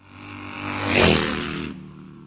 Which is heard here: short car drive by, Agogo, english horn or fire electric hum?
short car drive by